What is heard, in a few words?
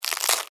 Insect Eat Chew, Bite, Crunch, Bug; Animal; Insects Eating; BlastWave